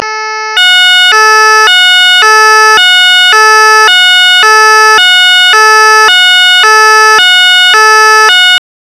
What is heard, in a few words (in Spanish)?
Sonido francia gendarmerie; abuc system; AS400 Series